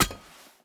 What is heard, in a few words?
salvaged axe strike muted; Rust